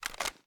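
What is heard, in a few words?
weapon foley assault rifle reload fast empty end; weapons; gmod; viper; scharlie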